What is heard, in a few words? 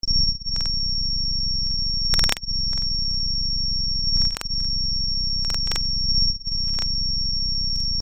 Record Noise; Construction Kit; HIP-HOP; Sonic Foundry (Sony Creative Software)